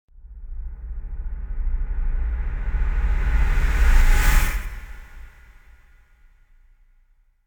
Impacts Distant Multi Hits Breaths Finale Hit - Reversed; Hollywood Edge; sound designer's toolkit v2